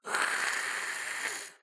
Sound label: Visitor hiss